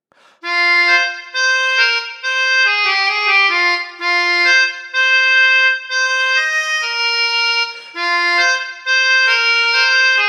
Melodyka

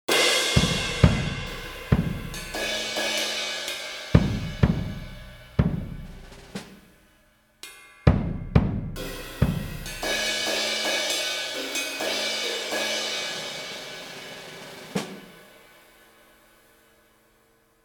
Zestaw perkusyjny

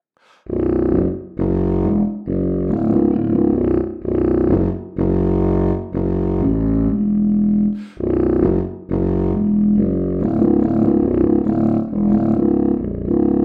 Kontrafagot

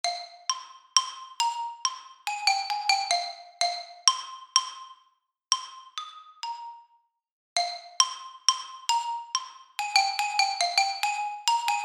Ksylofon

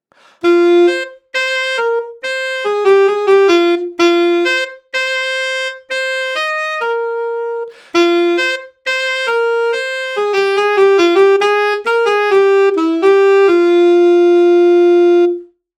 Saksofon altowy

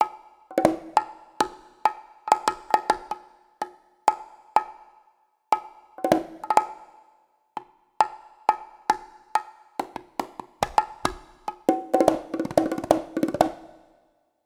Bongosy

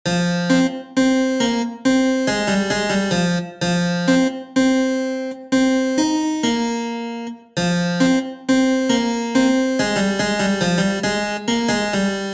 Klawesyn

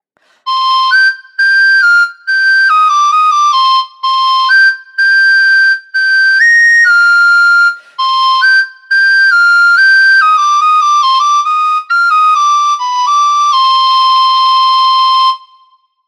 Flazolet francuski

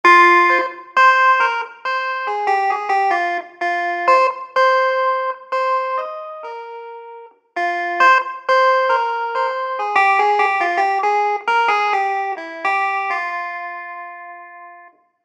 Wirginal

